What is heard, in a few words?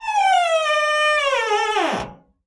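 door creak small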